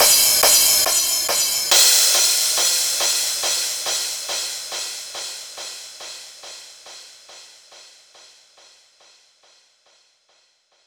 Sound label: Crash Mix, 140 BPM; Vengeance Samples; VTDS2 Song Kits; FX Vocal Candy Guy